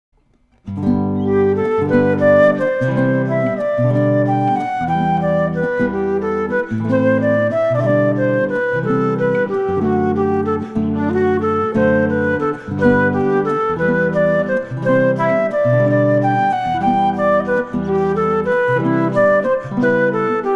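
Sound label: Jesu Joy of Mans Desiring with flute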